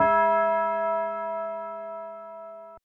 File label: BELL